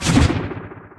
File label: glaives attack; weapons; dota; silencer